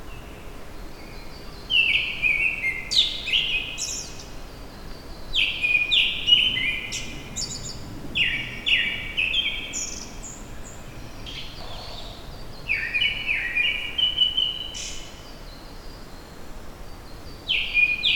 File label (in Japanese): bird